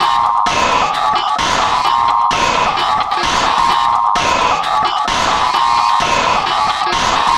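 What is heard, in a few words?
Loop, beats; Sonic Foundry (Sony Creative Software); Esoterik Beatz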